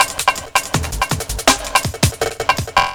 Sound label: Pressin Break; Jungle; Breaks; Blu Mar Ten - Jungle Jungle - 1989 to 1999 Samplepack